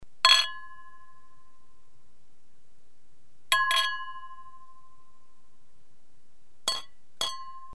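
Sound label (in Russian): бокалы большого размера округлые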